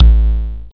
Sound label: BDFX L; Big Beat Samples & More; AKAI